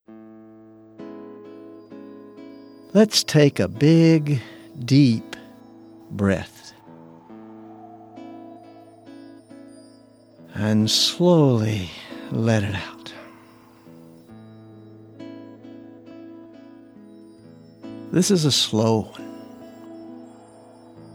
Parachute Activities Soft Winds

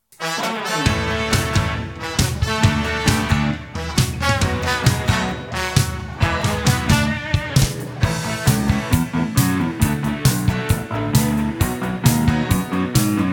Rock n Roll Instrumental

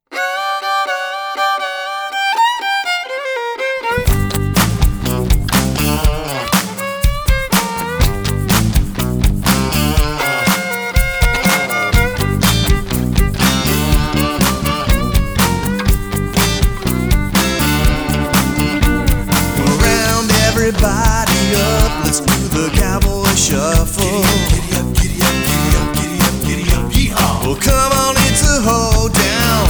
Cowboy Shuffle

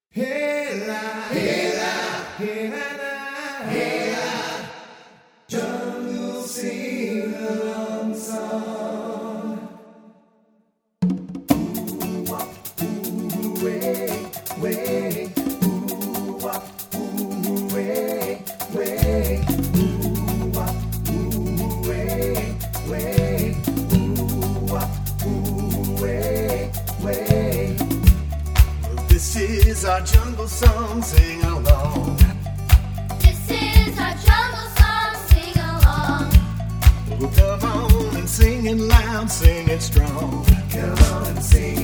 Sing Along

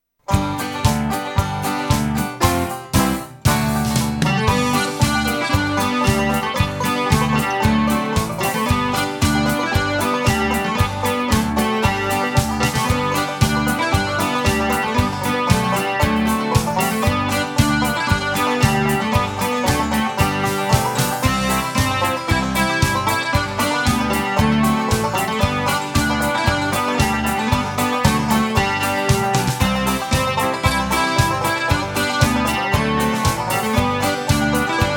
Instrumental

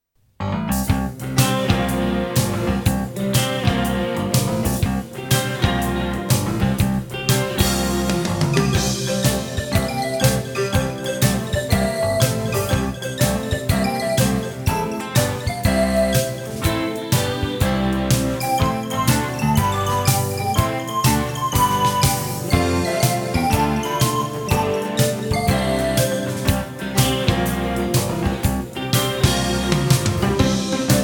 Pop Goes the Weasel Instrumental

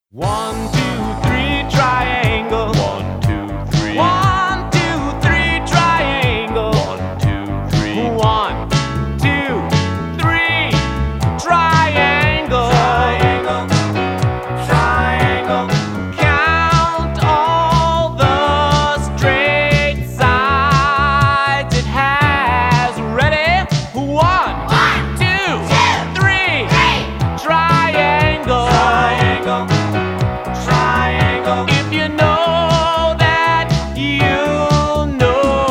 Triangle

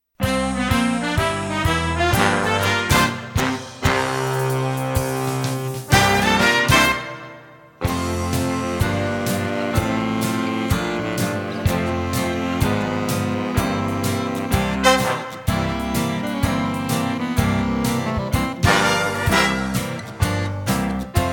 Big Band Instrumental

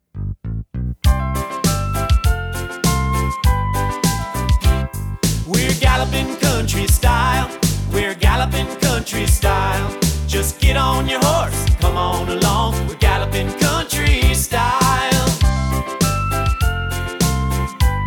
Galloping Country Style

